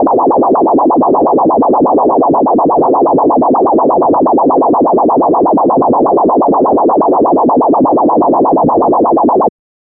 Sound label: system activated robot